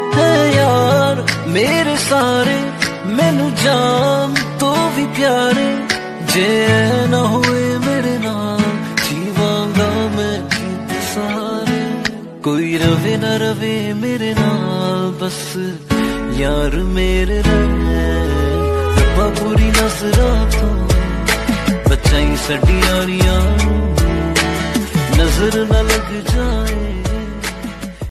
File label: romantic love ringtone, funny